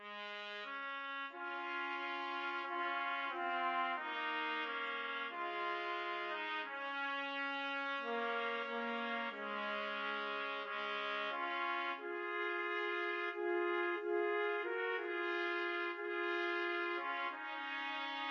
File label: were you there trumpet duet